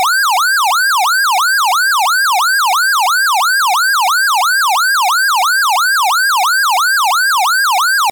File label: Yelp B, siren; whelen